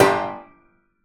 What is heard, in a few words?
piano mallet strike metal damped g full; sonniss gdc